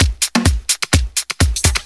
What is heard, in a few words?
Straight Loop 128 BPM, House